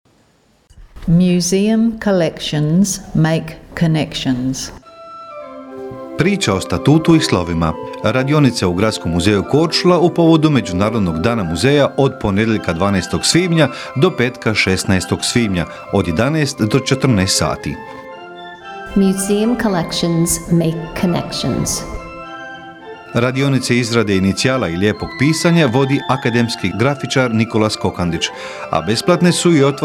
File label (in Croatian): JINGLE; RADIONICE U MUZEJU